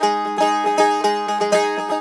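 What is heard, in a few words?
G#, bouzouki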